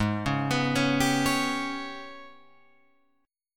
G sharp Minor Major 7th Double Flat 5th, Guitar Open F; arpeggiated; JGuitar